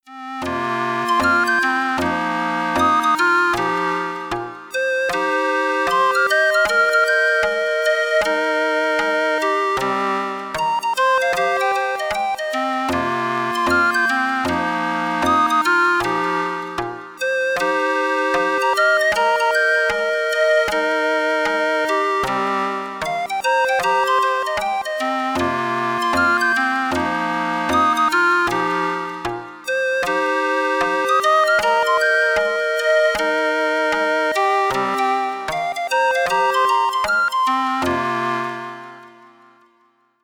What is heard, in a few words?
flute far east; obi